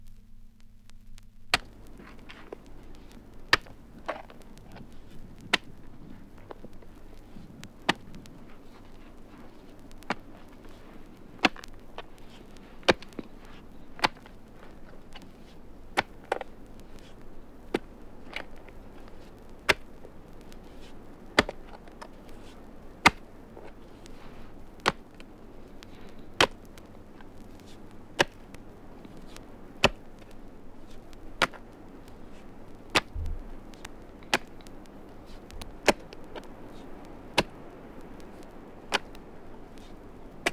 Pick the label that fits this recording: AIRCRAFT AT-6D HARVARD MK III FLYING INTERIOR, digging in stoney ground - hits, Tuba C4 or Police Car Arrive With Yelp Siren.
digging in stoney ground - hits